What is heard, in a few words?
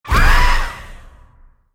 scream; hangman